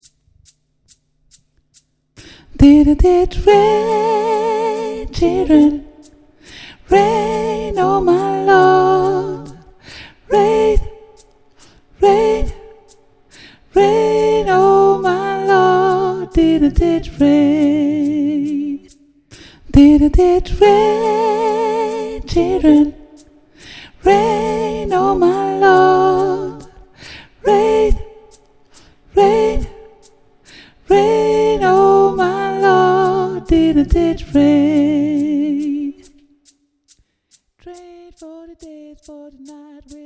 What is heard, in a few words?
Alto, Gospel; Didnt it rain